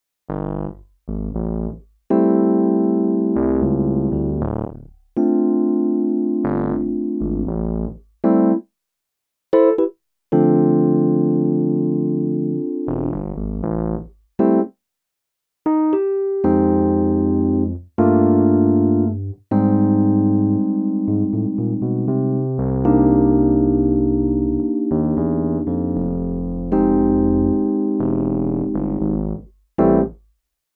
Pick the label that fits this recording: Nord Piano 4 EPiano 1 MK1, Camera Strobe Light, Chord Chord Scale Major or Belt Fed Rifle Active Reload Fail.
Nord Piano 4 EPiano 1 MK1